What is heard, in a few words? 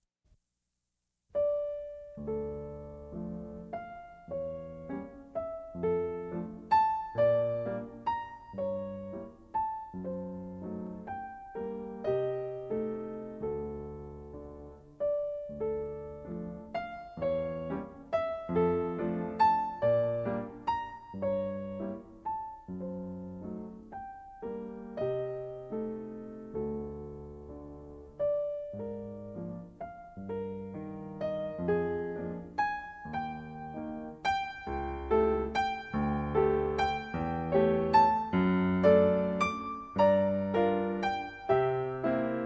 waltz; Brahms